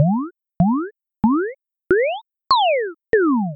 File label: Camera Rotate Zoom; Animal Crossing